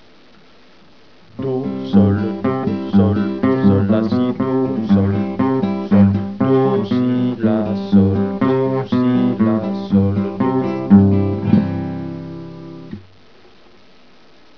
ONE STEP, guitare

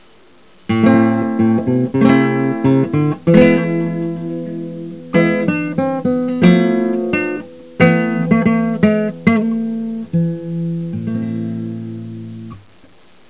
PAVANE, guitare

